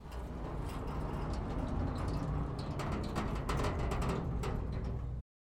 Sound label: Gate Opening